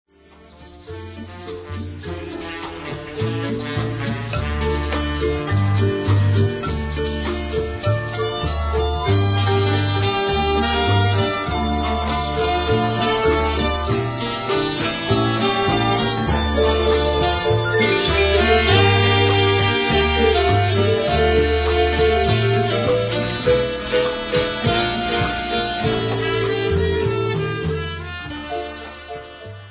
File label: Latin Passion